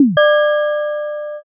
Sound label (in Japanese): shishiodoshi